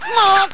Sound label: death; quake2; penguin